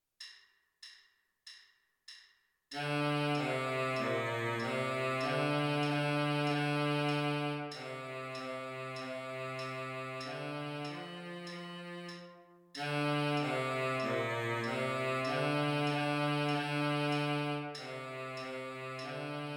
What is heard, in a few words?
baritone sax